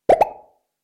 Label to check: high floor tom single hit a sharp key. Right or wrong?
Wrong